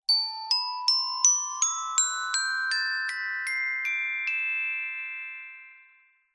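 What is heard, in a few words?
Xylophone Ascend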